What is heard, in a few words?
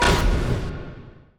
UI Counter; Monster Jam Steel Titans